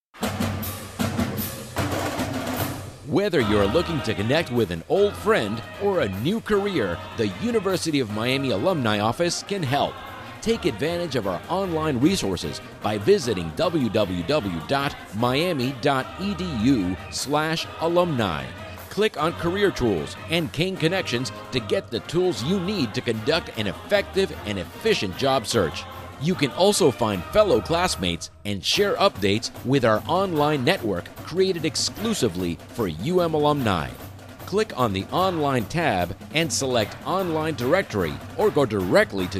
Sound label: On Hold; University Of Miami Alumni Office